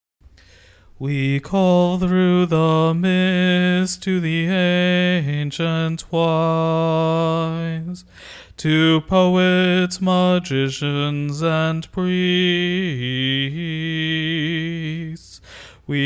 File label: hymn bass; music recordings